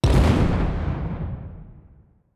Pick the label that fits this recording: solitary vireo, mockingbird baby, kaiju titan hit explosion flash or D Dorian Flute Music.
kaiju titan hit explosion flash